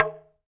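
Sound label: Talking Drums; Roland - LA Composer vol. 1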